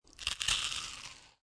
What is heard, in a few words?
Biting Into Flesh, Horror - Eating, biting into flesh; Injuries; Combat; Lynne Music